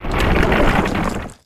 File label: Rock Debris Fall